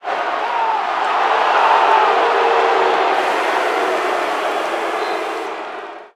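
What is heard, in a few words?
Basketball Crowd Boo; Crowds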